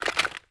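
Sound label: equip movement; Valorant; marshall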